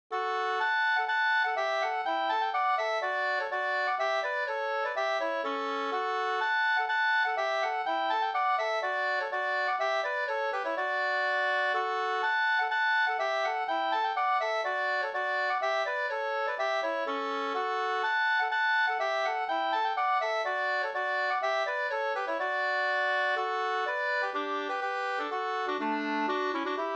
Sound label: polska; Vardens polska e klockare Vard e Hyvlar-Pelle Vs